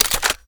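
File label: submachine gun reload fast mag out; reloads; iw8; gmod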